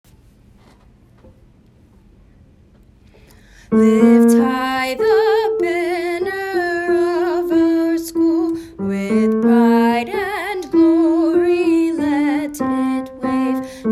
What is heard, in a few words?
School Song